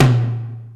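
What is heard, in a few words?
Tom Lo Slam Room, Alesis D4 drum machine; 300 Drum Machines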